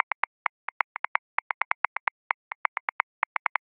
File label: Breaks Funky 130; Grooves; Ableton; Factory Packs